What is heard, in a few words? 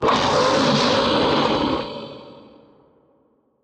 demolisher roar, enemies; Factorio